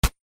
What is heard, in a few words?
shoot Sound